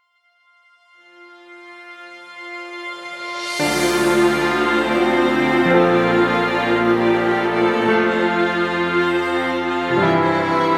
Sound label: Instrumental